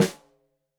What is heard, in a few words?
Snare Stereo, Drums; Deeply Sampled Drums